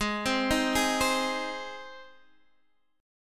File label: Ab Major Ab; arpeggiated; x,11,10,8,9,8; JGuitar